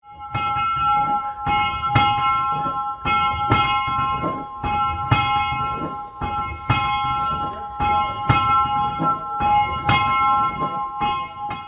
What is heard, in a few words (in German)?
Glocke